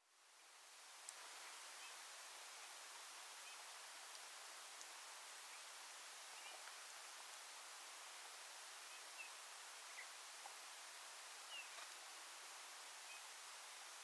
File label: Pyrrhula pyrrhula; SETE